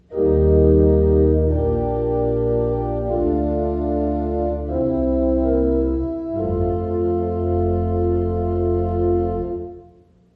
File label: simple modulation